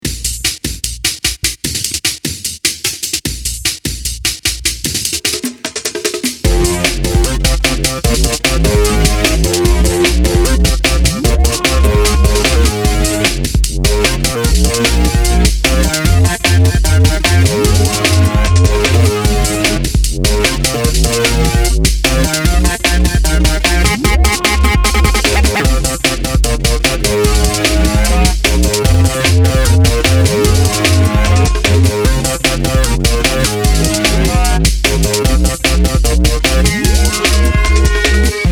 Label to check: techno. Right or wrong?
Right